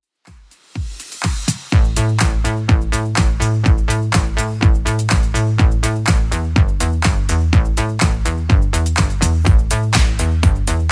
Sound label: 80s Club Edit 124bpm